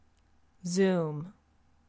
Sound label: zoom